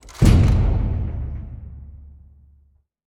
Platform Lock In Place Top; Scraprun; Deathrun

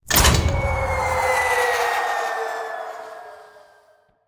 Bomb Release And Fall; Scraprun; Deathrun

